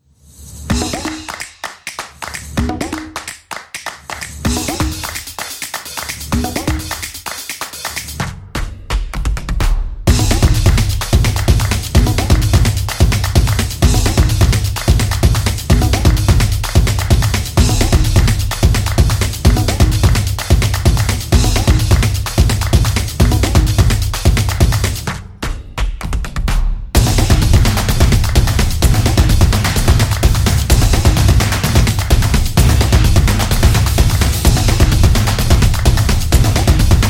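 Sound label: Mall, Sport percussion background music created for action, competition; sports beat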